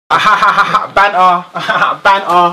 hahaha

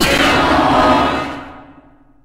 jumpscare; eyesaur

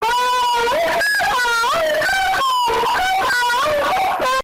orgasm

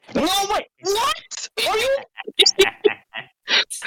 what hahahahaha

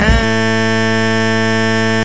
incorrect

